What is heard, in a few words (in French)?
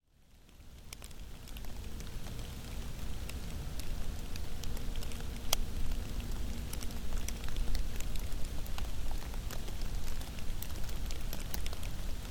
pluie